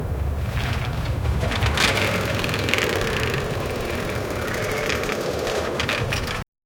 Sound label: bike tire treads rolling